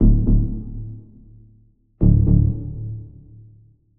Bass Synth Tension Loop 120 bpm D; MatiasMacSD; The Case

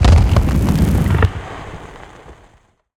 biohazard creature organic texture; BluezoneCorp; Biohazard - Organic Textures and Ambiences

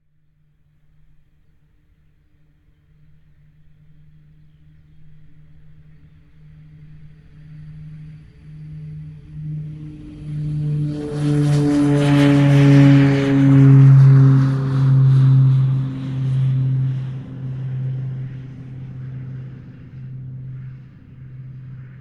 DeHavilland DHC6 - EXT - TAKE OFF - By; Pole Position; The Skydiving and Parachute Library; ORTF; MKH8040

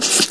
breath, fast zombie; hl2

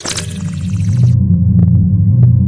battery plug in; hl2

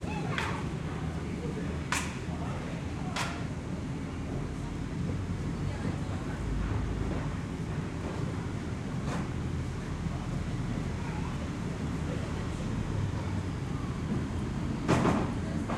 City Ambience, Manchester Ambience; InMotionAudio

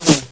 whiz, sniper; hl2

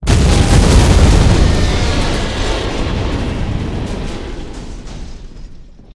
gunship explode; hl2; combine gunship

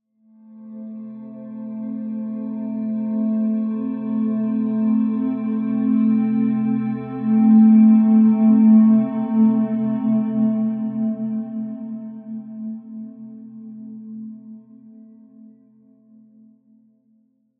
Synth Pad; CB Sound Design; Dreamcatcher; The Sound of Dreams & Magic

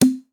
Cork, Pop, Strong, Classic

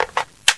ar1 clip out, weapons; hl2

